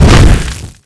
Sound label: flame, dragon